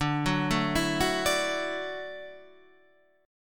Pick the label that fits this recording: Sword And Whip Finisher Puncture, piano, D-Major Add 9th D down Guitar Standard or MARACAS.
D-Major Add 9th D down Guitar Standard